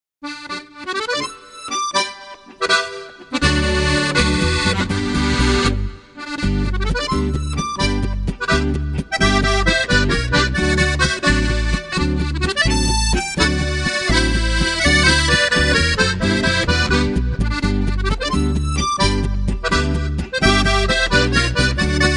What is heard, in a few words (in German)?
polka